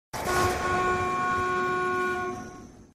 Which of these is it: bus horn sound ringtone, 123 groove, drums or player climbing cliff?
bus horn sound ringtone